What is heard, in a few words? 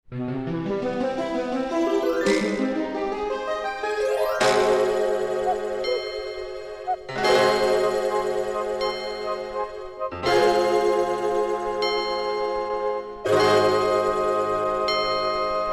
kukushka